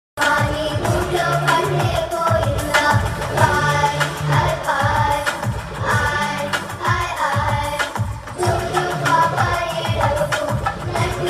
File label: interval ringtone; interval bgm